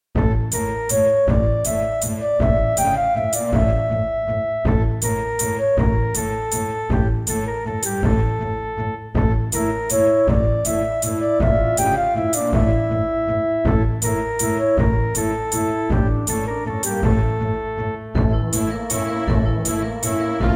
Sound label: Yamaha QY70